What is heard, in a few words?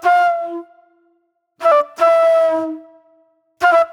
Groove Flute; Sonic Foundry (Sony Creative Software); Manhattan Flutes; Super Brown